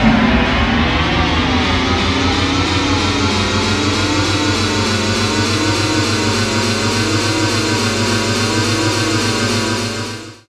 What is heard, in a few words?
X MACH, MACHINES, Production Effects